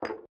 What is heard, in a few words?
biolab beaker; factorio